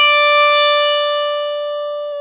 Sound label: GTR Tube, Dirty; Club-50 - Foundations Roland